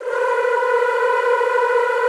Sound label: B3, Extended Classical Choir; Best Service